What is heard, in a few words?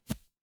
paper fabric fabric